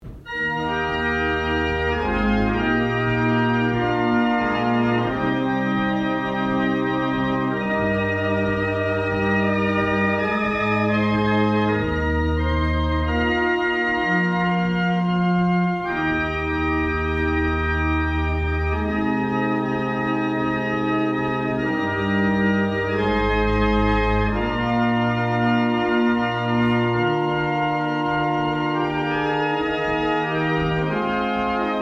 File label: Chant, barrel organ; Brightling